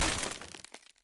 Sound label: ice block smash; frozen rush